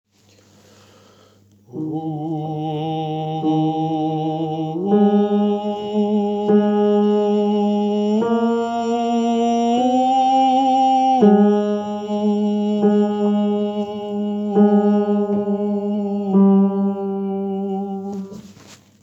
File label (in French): ténor intro